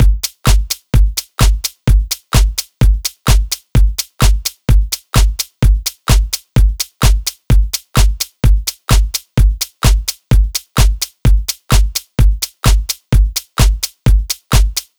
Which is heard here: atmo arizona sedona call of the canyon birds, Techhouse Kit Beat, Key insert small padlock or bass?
Techhouse Kit Beat